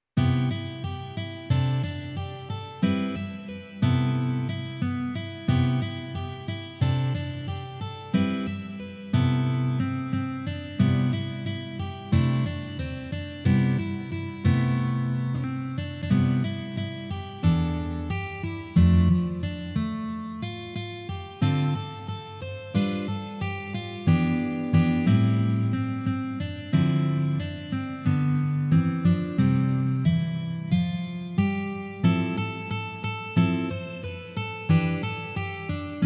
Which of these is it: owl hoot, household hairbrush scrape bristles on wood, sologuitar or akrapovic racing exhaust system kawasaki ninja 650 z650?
sologuitar